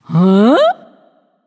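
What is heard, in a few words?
confused, female; smite; voicepack; hera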